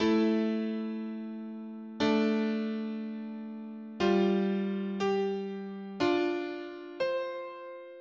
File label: two voices